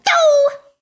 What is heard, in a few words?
doh; daisy; sm64coopdx; char-select-extra-chars; [CS] Extra Characters